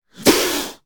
Cobra Attack

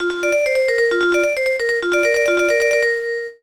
Jingle; Imagine Master Chef; Imagine Happy Cooking

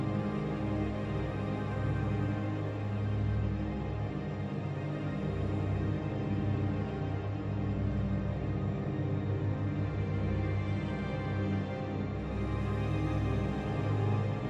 Loop; Outro Grey Interior Second Bridge

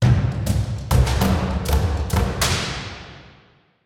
Combat Drum stinger; Necropolis

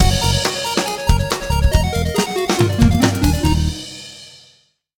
(Jingle) Victory; rayman arena; The Teensies

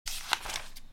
enter note; through abandoned the underground city

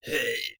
Monster Strain

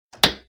Open Piano

